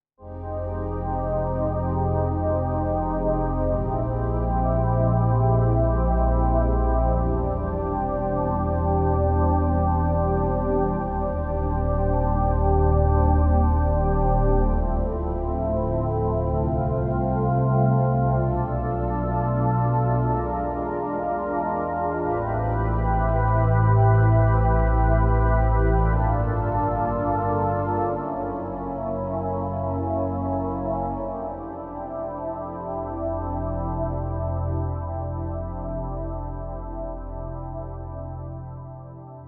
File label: Organ Pad; DEXIBELL COMBO J7; organo digitale